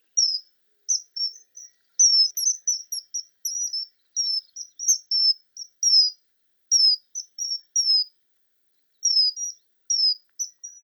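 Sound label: common kingfisher song, birds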